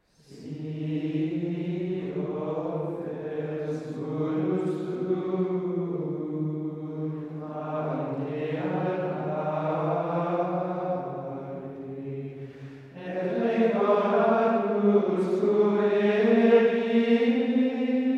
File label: Antiphon SI OFFERS and MAGNIFICAT; Vespers Compline